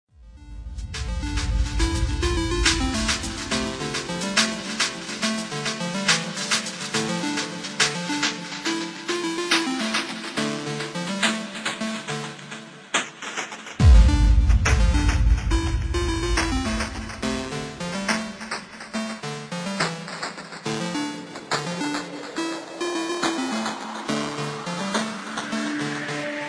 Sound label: dubstep